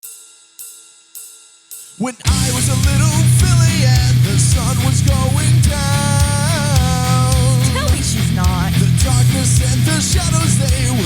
metal cover; mlp

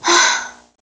Breath; Lewd SFX

